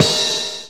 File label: XV Hat O, Roland XV-5080